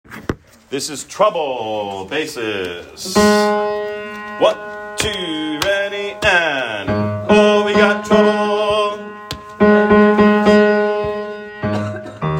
Trouble Bass, vocaltracks; Rehearsal Tracks

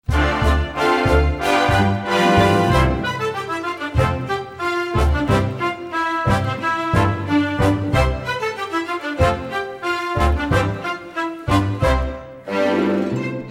Guided Vocals; Cinderella

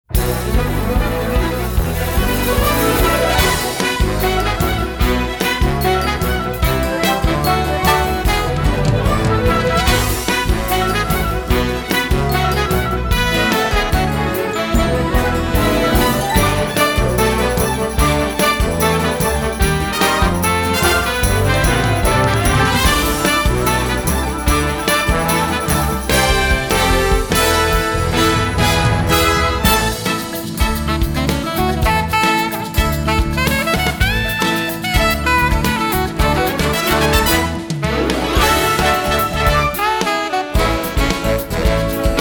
Exit Music; Finding Nemo; Rehearsal Tracks

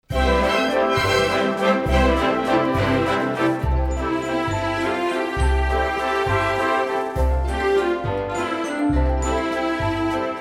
Waltz Underscore; Performance Track; Cinderella

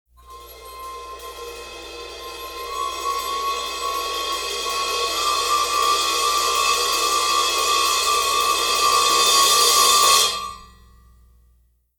2nd Glass Tipping

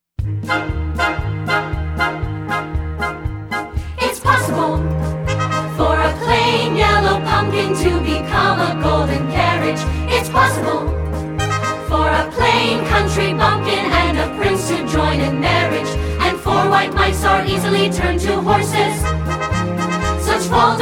Guided Vocals; Cinderella

